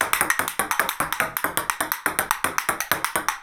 ping pong

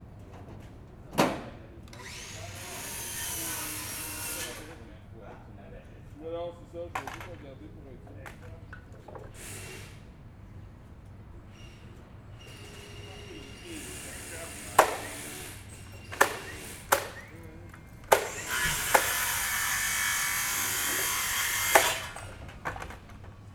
construction

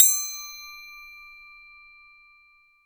tinnn

